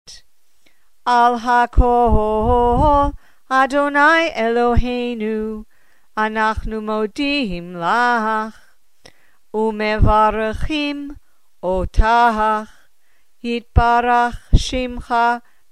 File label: before Haftarah